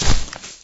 Grass Light Medium, shagov

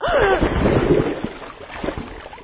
female drown

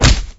Combat Brawl Punch Hit

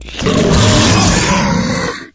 Ghoul Wound; Dungeon

